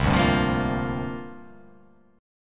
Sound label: C 7th Suspended 2nd Suspended 4th C down